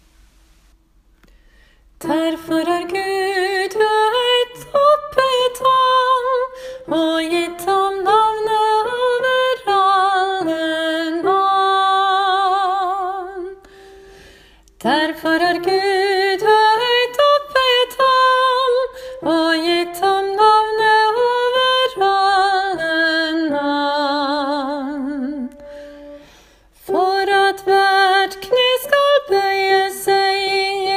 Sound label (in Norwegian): sopran; åfjord